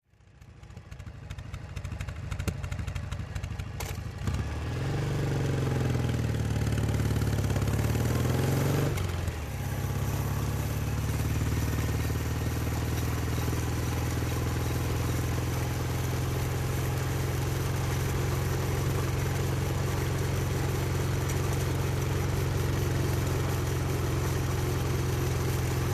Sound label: Motorcycle, Norton, Tailpipe And Chain Pov, Steady, Medium Speed.